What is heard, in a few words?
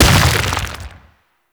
Brawler Rock Elemental Punch Impact; Warframe; Warframes